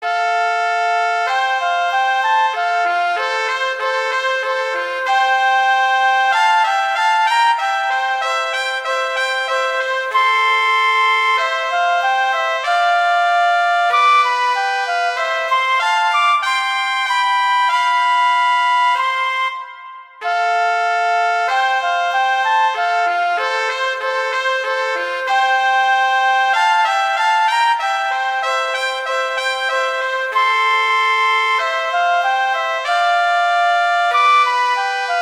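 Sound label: Duet, horn; Mozart; Duets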